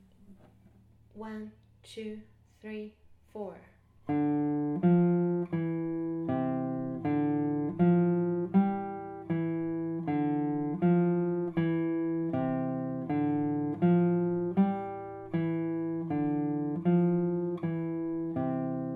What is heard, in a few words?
Guit